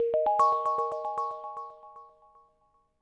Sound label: windows logoff